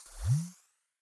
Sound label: platform appear